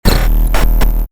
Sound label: glitch